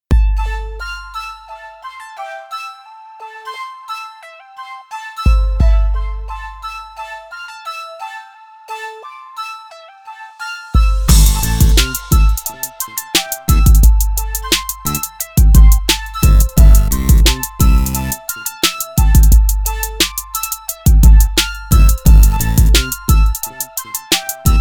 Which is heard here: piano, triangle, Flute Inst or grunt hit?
Flute Inst